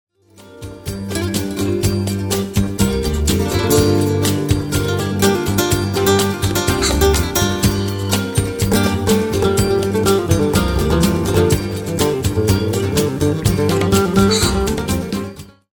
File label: Flamenco Guitar; Flamenco guitar masters; Flamenco guitar classics